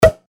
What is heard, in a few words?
drinks champagne bottle opening plopp